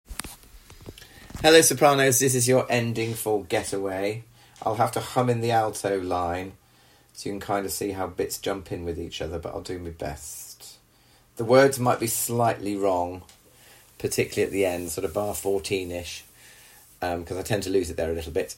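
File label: SOPRANO Get Away Ending; Sops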